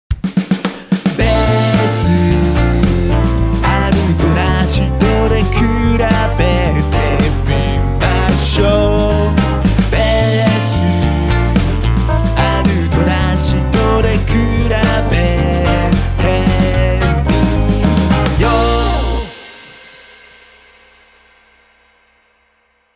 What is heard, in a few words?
bass